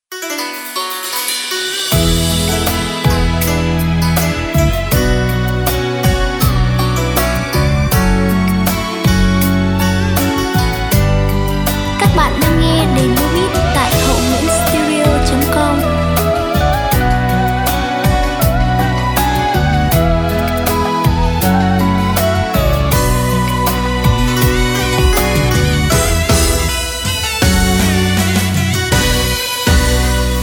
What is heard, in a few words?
beat, playback